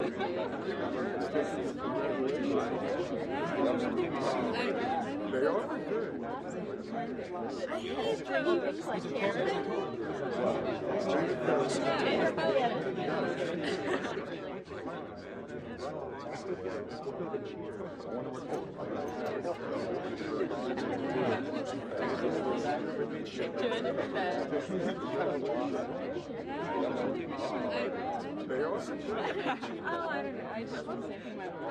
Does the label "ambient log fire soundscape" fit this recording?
No